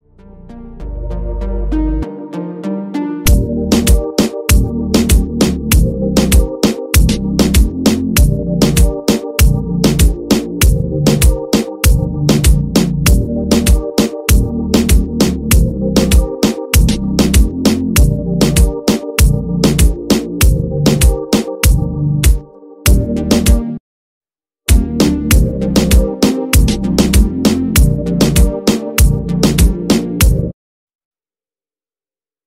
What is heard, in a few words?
Instrumental